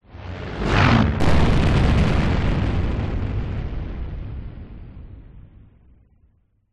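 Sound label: plane light prop crash explode exterior; vehicles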